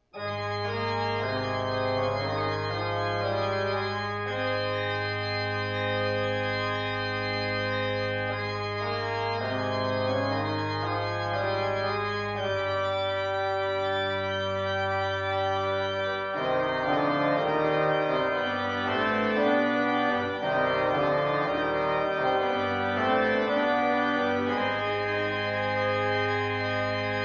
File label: Organ Tapestry